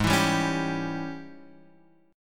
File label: Ab Major 7th Suspended 4th Sharp 5th Ab Guitar Open F; strummed; x,3,4,2,4,2; AbM7sus4#5; JGuitar